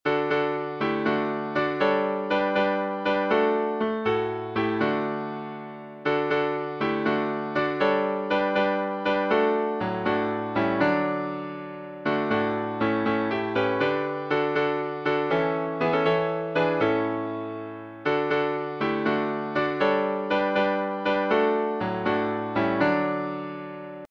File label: Hymns